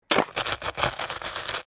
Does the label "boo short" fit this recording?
No